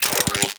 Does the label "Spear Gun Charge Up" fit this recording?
Yes